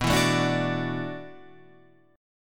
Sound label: E 7th Suspended 2nd Suspended 4th, B